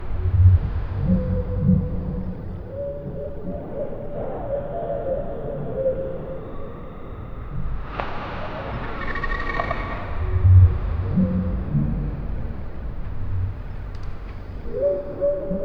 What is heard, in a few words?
animal disharmonic deep; clicking